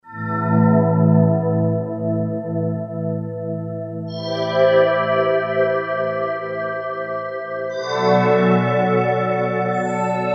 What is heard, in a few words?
ME Forest, Yamaha TG77; Factory Presets